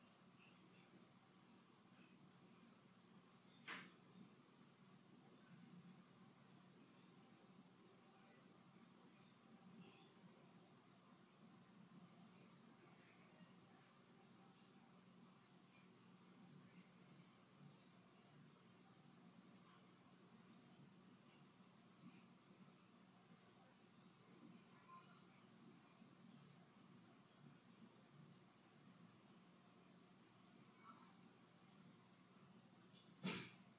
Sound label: Empty Room; historic rainworth lodge investigation